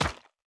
Weapon Furniture

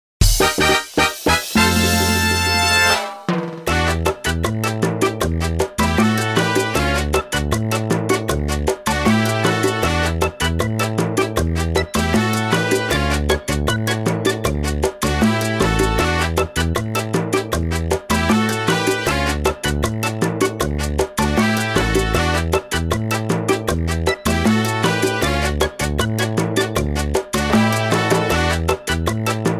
Reward; Mario Kart: Double Dash!!

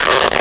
Balloon

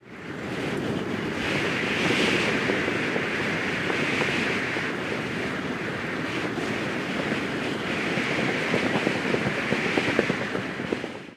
Monte Wind

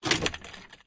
Cruiser Insert Key; Lethal Company

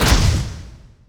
Enemy Rose Queen Active Attack Shot

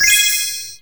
Item use; SE Ing Other Rule#11 (SE Ingadv00 ItemuSE)

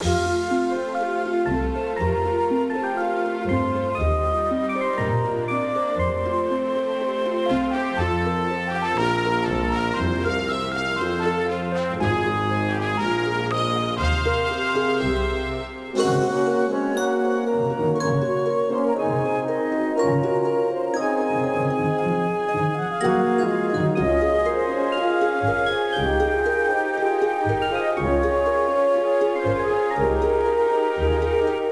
Musicloop; Pokémon Masters Arena

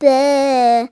Kid Baa

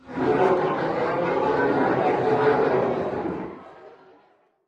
Mask Attack Player; lethal company

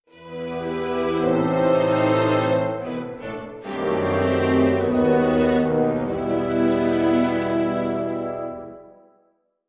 Dusk 150bpm 3.4time; Don't Starve Giant Edition; PS Vita

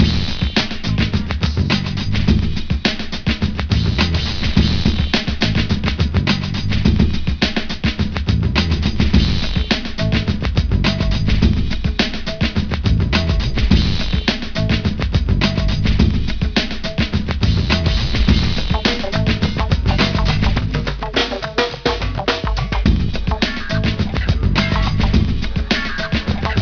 Menu Music; Brian Bright; Tony Hawk's Pro Skater 2; PlayStation

